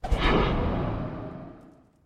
alert sound

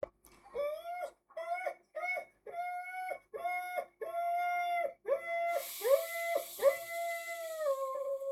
dog crying

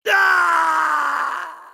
crashed scream; mk9; raiden

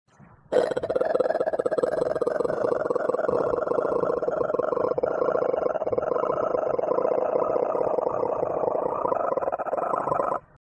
alien sounds

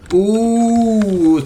oooo

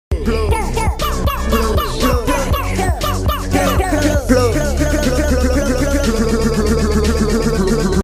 pluh phonk

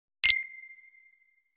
menu accept

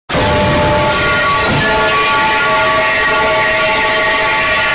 brutal metal pipe

